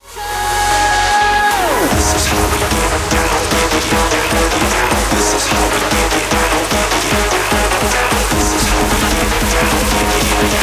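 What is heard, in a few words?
background music crossfade; Invaxion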